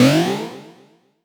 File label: Jump Gimmick; Pac-Man Championship Edition 2 Plus